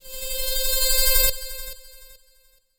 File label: SPACE FX 140; SpaceSounds